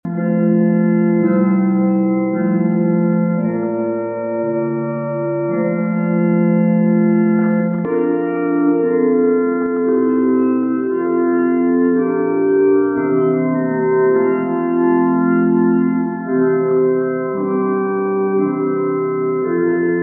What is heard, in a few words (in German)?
Orgel; Weissuhnen; ostpreussen; Kreis Johannisburg